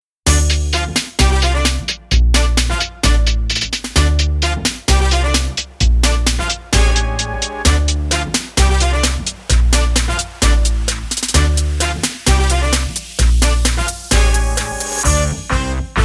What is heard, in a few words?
Trap N Swing